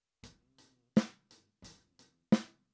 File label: SNR